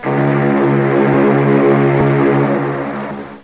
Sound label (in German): Nebelhorn tief